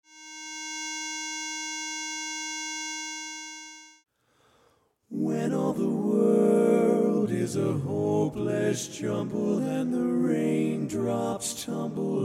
bari, A Capella

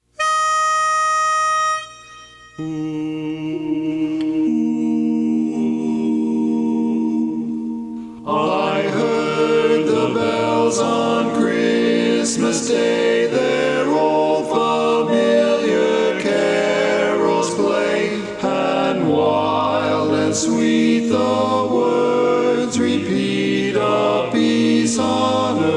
baritone; i heard the bells on christmas day

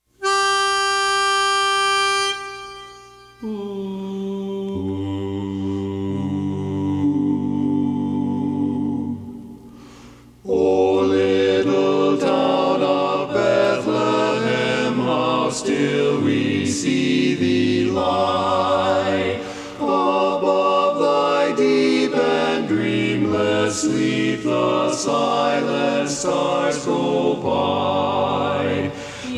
baritone, A Capella; o little town of bethlehem

